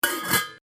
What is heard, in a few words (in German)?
Deckel